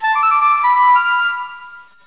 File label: flute